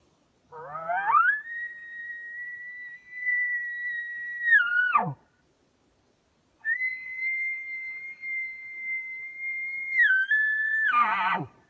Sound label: Calls Location Bugle